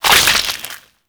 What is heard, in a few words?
Tendrils Burst; Warframe; Infestation